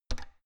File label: oven button press squeaky